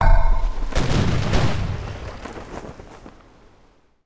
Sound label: Build Galleon; Civ4 warlords